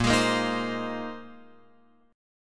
Bb 7th Suspended 2nd Suspended 4th Bb, Guitar Open F; Bb7sus2sus4 strummed; x,5,8,5,6,7